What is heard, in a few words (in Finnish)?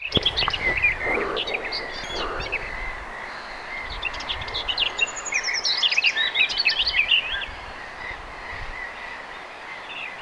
laulava lehtokerttu; toisen lehtokertun laulua